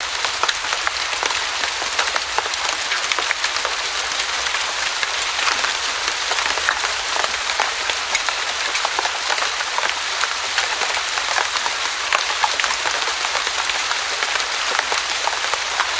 GF Rain; gnarled forest

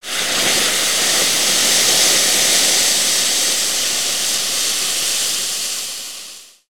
Freeze Wand Sizzle

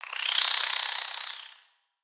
Spider Sleep; creatures mechanical; Lego

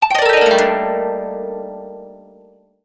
Music Strum; Dragon In A Box; destructibles

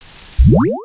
Sunflower Spawn; weapons; aronwk collection; ndaudio

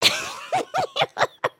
giggle

